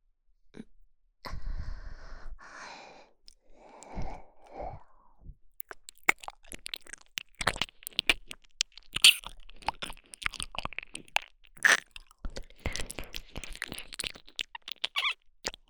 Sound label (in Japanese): 耳舐めのみ(右耳ver）, ASMR; 癒らしママ